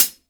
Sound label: 14INCH ROCK HI HAT